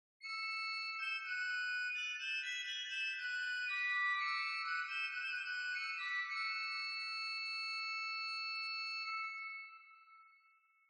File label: sesquialter